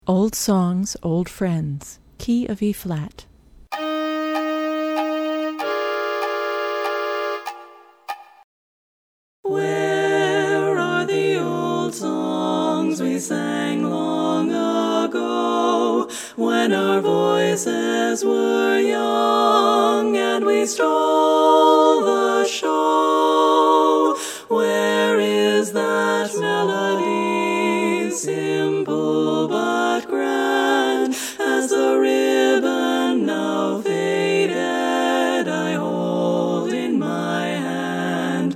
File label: Old Song All